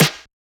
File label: Snare; FLPRO